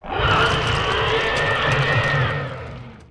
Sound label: shout; RYL 2